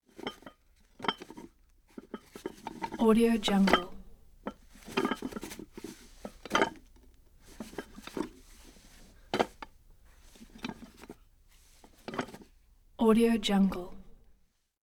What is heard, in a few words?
barbells lifting set of weights up down; prosoundfx